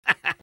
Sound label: soldier laugh short; vo